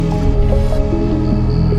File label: bag out